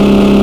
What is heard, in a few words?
Gtr R35 High; gamerip; Car Simulator 2; android